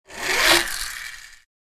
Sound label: Toy car releasing after wind up clattering